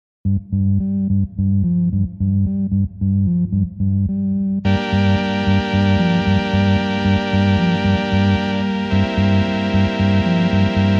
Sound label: dramatic theme